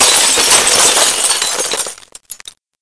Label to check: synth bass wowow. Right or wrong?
Wrong